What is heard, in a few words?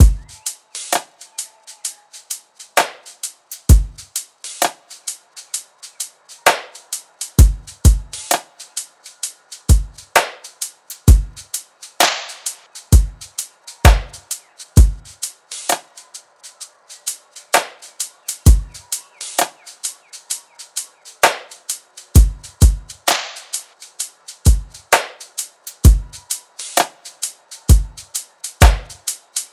drums 65, Full Drum Loops; Slate; SEISMIC Drum Sample Pack